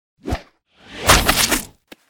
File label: attack; hero